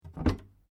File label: Door pivot wooden closet understairs open; Doors; Various doors; Miscellaneous Doors; SoundEffects Foundation2